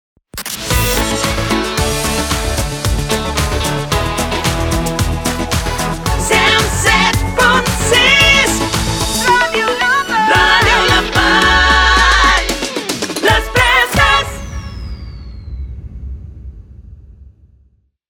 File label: Jingle; Radio La Vall; LONG SNAP